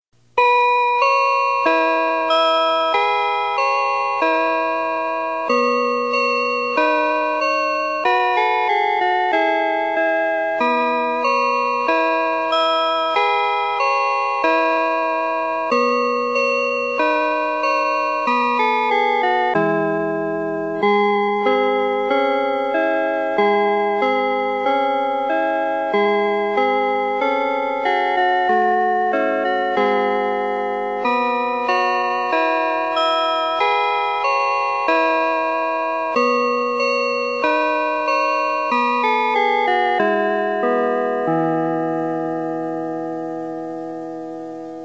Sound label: melody, system clock; densetsu